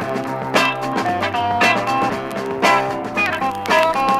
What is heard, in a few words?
guitar, Loops; Phonky